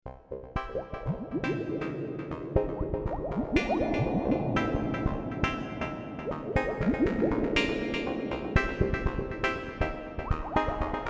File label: Under Water Techno